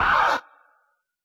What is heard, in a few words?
SCR, Yamaha DJX, B900 Kit